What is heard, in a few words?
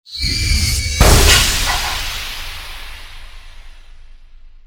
net slam, ships